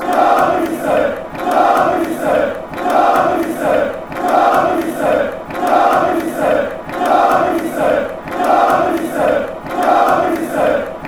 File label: slovenia chant